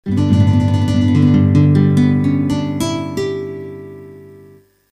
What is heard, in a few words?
nylon